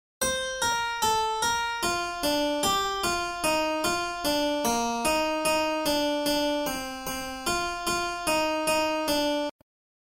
Tema e risposta

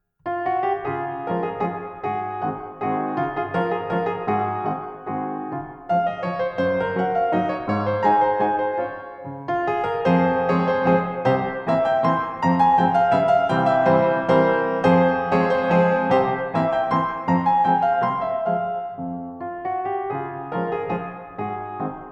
Valzer; von L. E. Czaper

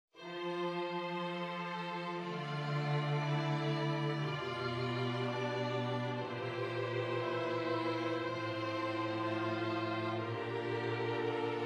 esercizi di contrappunto semplice A quattro voci; Strengl; Ronge

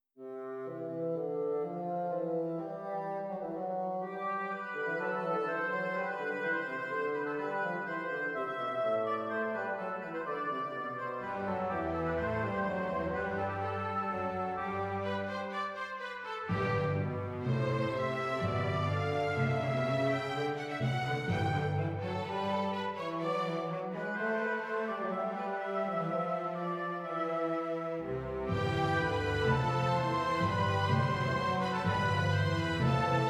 Orchestrazione; Ronge; Gerd Prengel

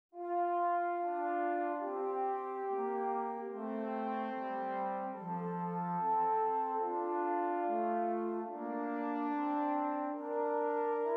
Esercizi di contrappunto a due voci; zweite Gattung

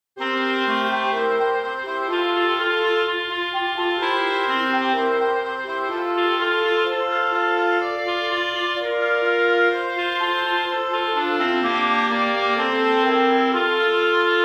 Canone; Mozart; O du eselhafter Martin

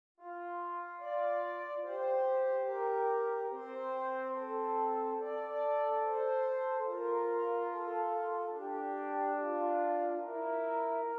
Esercizi di contrappunto a due voci; zweite Gattung; Ronge pagina 59

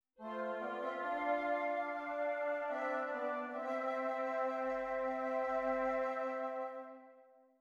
esercizi di doppio contrappunto nella decima; Chiusa di Albrechsberger; Ronge

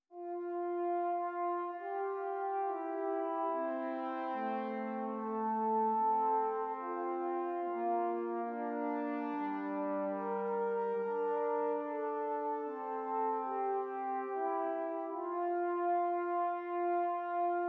Esercizi di contrappunto a due voci; viertes Gattung; Ronge pagina 60

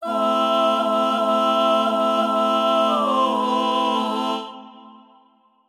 Quartetto solo incipit; Silvio amante disperato; da Thayer

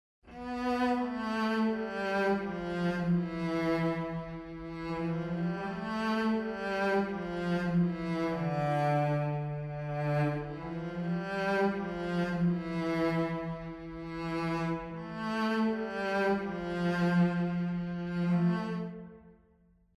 Canone aperto

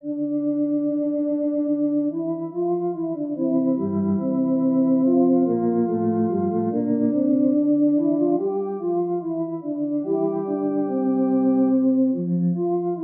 Esercizi di contrappunto a due voci; Funftel Gattung; Ronge pagina 60

